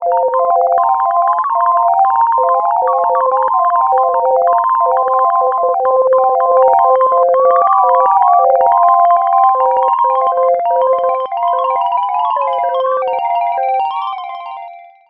Sickly Random Arp; sequential

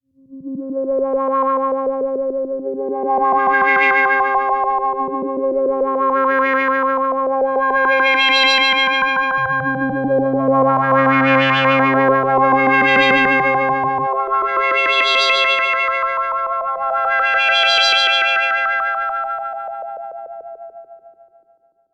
Filter FM; sequential; Body Mod

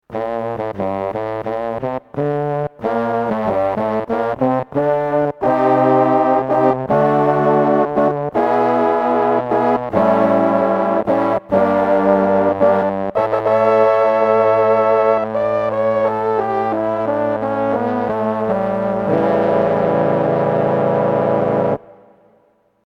m300 trombone

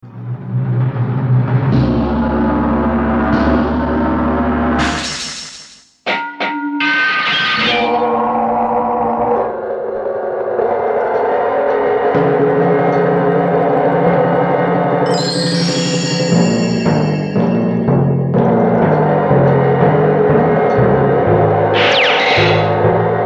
fx percussion

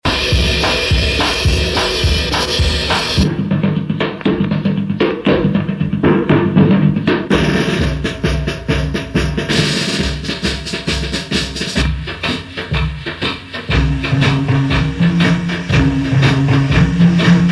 Rhythmate

